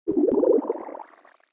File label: tone